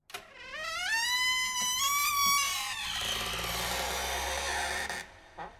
rechinido

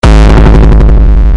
bajo

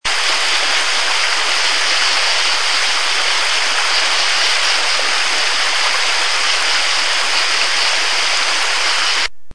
Bosque arroyo

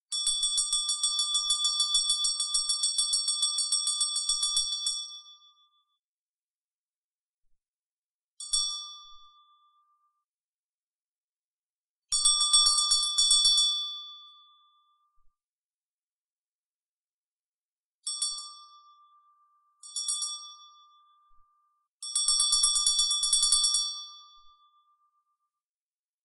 campana

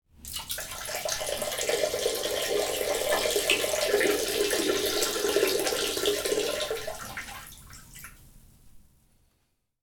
urinario

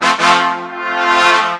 ganar ganador

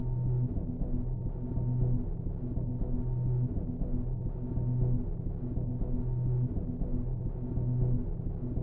granulados

